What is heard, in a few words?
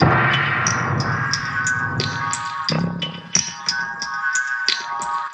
in the forest, 090 bpm, drum loop